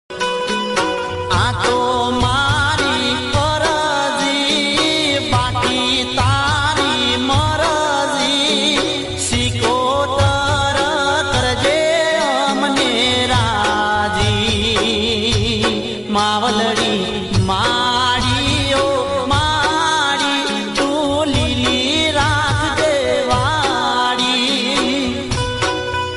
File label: devotional